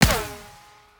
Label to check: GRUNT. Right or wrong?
Wrong